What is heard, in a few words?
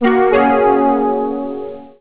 Chime, Cisco Unified IP Phone 7945G